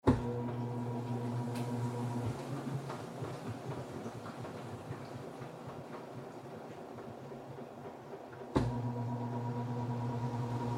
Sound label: washing machine